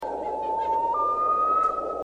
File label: Loon Sound